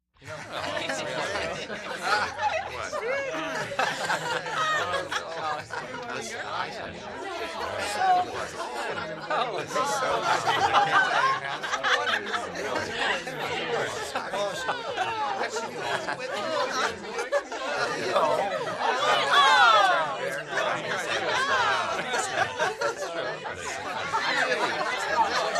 Party Crowd Cheerful

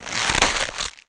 Cabbage Rip Quick; gore stabs & eat

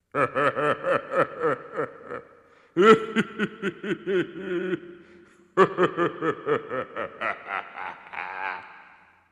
Maniacal Laughter

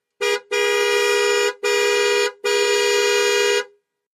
Aston Montego Int Hrn; horns

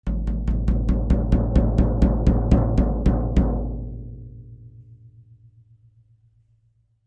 Drums Toms C Low Slw Do

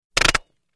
Silenced Machine Gun

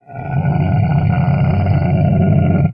Camel Groan Growl Sin; camels

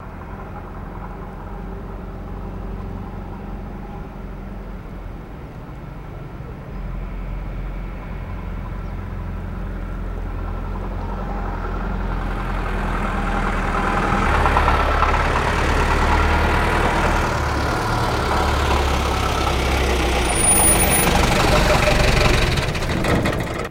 English Taxi Drives

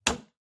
hammer hit

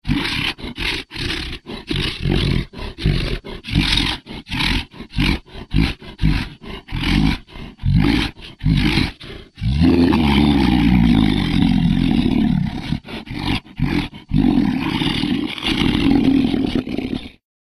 Monster Angry

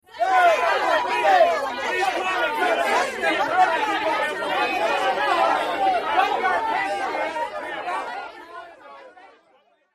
Reporter Walla Yelle, questioning walla

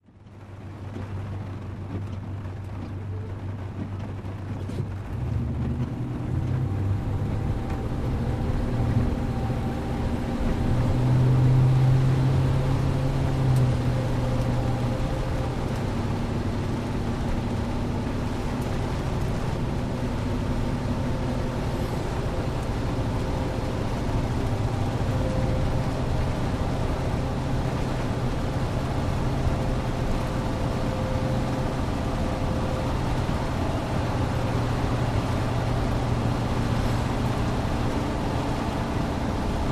in car drive rain; wet traffic

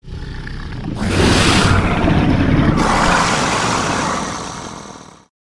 Monster Combine, monsters

